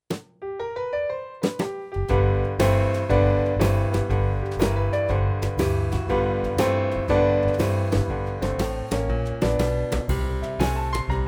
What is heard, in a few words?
Blues'n boogie; Jazz